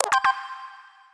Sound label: success login